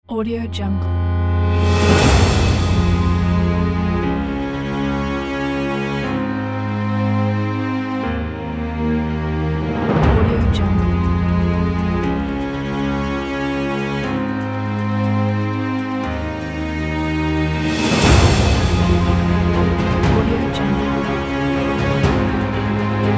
patriotic epic; legatopromusic

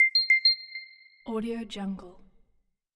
notification

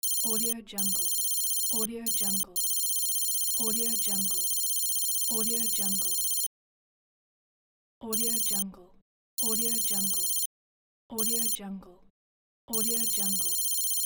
computer digital text; andrewsound83

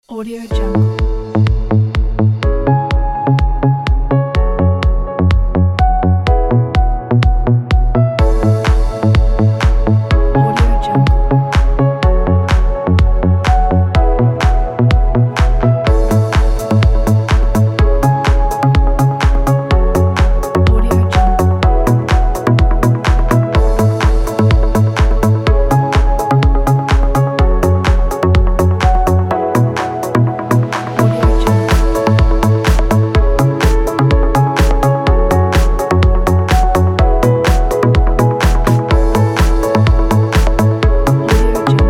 summer dance

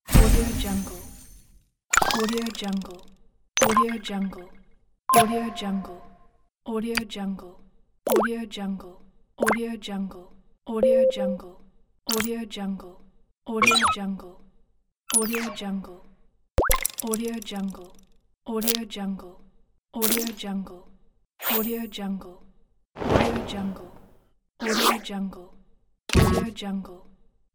hitech menu interface pack

